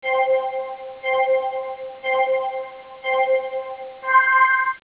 ui countdown sound, Motorola ROKR EM35; ui sounds

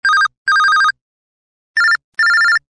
Tone; LX610 Lotus Elite

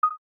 Cam Start; Samsung Galaxy S10; SamsungInCallUI

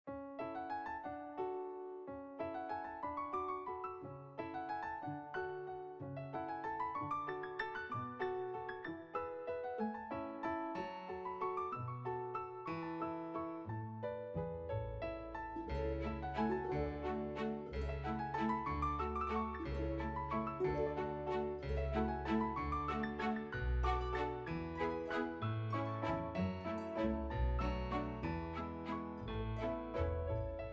Morning Coffee, ringtones; LG; VELVET 2 Pro

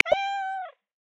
Otocolobus Manul; Xiaomi Redmi-Note-8-Pro; South West Mountain

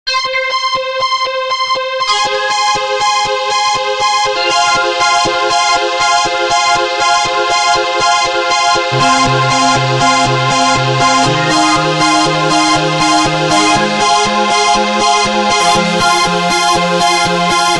Alarming, phonetones; Sony Ericsson; PlusFourSix; Thomas Tibert

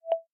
camera focus, ui; Obi Worldphone SJ1.5

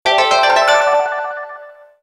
Japanese message; Nokia; Audio themes

